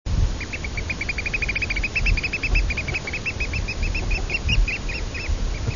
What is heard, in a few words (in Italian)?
beccaccia di mare